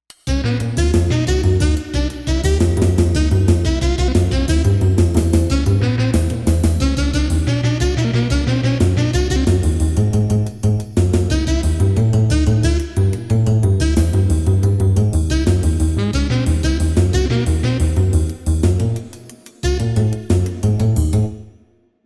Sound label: FREE JAZZ, synth